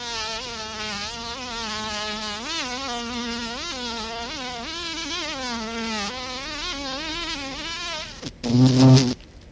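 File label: buzzing sound of carpenter bee; nasekomyh v prirode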